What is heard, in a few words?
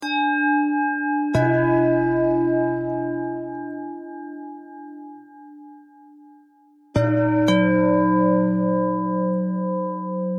bell bell thread noise; bells